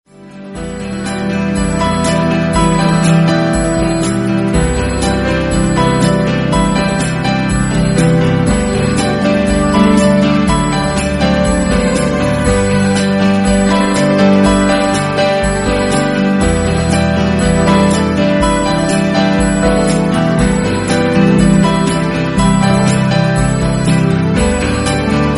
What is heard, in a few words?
apple iphone remix ringtone